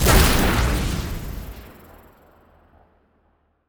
weapon missile; weapons; starruler

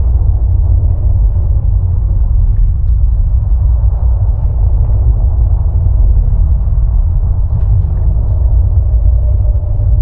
Blackhole ambience loop; starruler

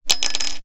cycle economy; ui; starruler

